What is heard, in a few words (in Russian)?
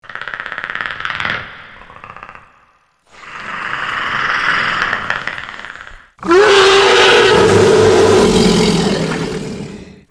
zvuk hischnika